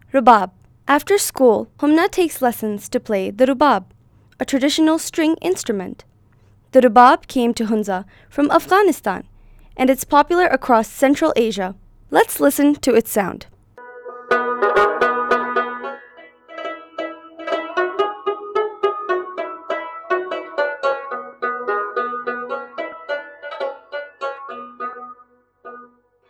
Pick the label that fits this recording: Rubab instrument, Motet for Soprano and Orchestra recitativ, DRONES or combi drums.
Rubab instrument